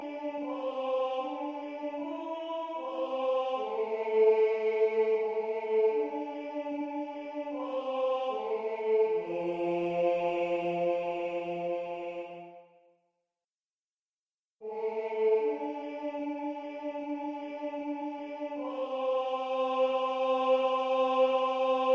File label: Tenor, barbershop